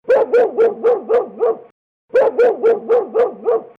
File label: Dog